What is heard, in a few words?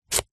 apple chop knife wooden chopping board